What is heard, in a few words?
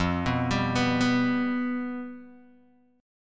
F Suspended 2nd Flat 5th, Guitar, Open D